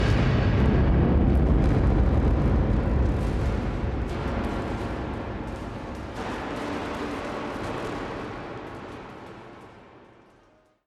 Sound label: Explosion Or Collapse